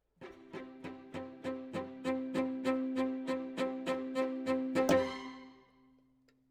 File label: Cello Solo